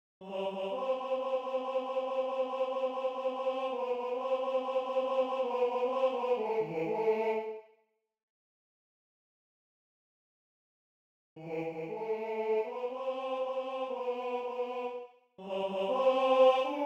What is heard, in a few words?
Tenor II; Aus der Traube in die Tonne